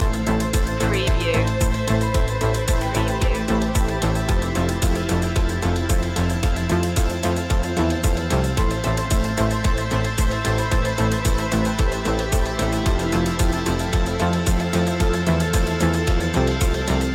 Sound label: reflections loop